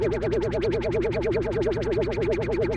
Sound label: Hover Car; Megacity-Sample; Cars; UnityTechDemoCars